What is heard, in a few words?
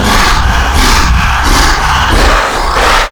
run; slender; quadralex